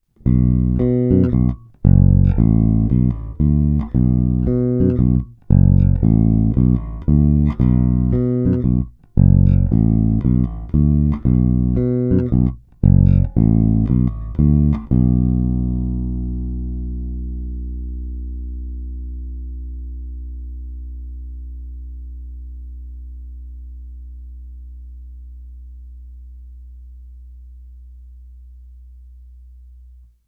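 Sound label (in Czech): Oba snímače, Jazz Bass; Sadowsky NYC Ultra Vintage J Bass Guitar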